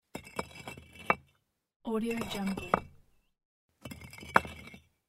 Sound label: laying bricks; vintervarg